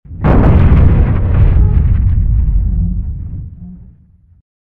collision; colony7

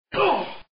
pain

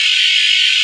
phaser firing, weapons

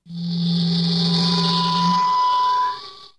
transout

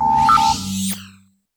holo change

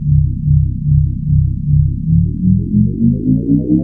pulse overload

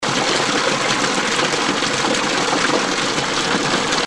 water falling; colony7

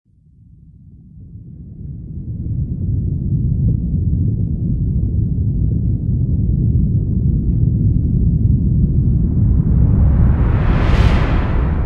landing; colony7

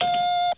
button

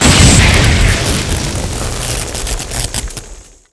explo

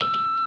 button

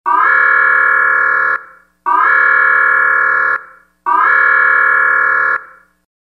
alarm; colony7

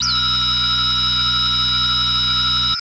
fire laser; weapons, hunter seeker

